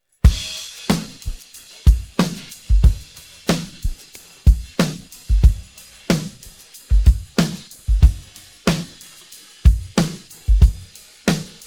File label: pattern, drums